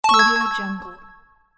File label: special item